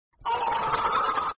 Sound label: screaming emoji meme